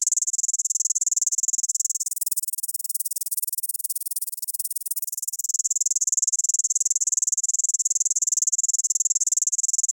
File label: text moving cinematic